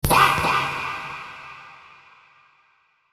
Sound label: faah sped up